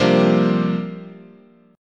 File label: C 9th Suspended 4th, guitar chord